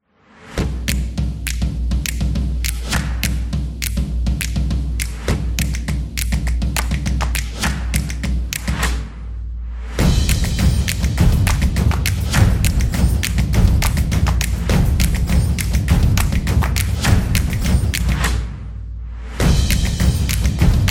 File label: Drumming Clapping; soccer stadium